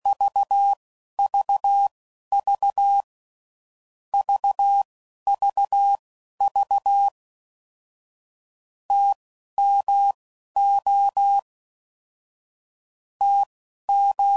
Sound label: DAH Words; Morse Code; CW Code Groups 10WPM; Basic Letter Groups; Basic Sets